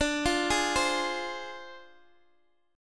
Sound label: D Minor 7th Flat 5th, Guitar, Open D